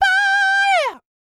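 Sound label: falsetto, R&B Dance; Spectrasonics Vocal Planet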